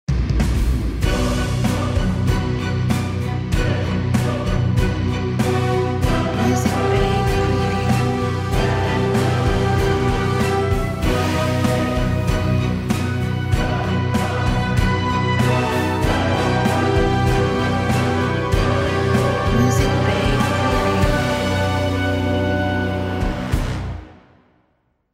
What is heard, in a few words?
Heavy Metal Orchestra Cinematic Logo